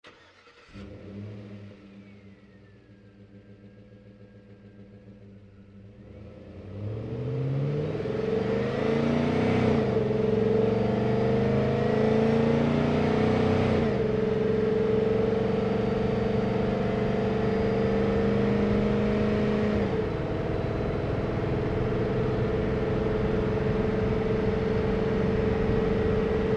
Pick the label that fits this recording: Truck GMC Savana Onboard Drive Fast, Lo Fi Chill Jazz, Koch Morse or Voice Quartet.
Truck GMC Savana Onboard Drive Fast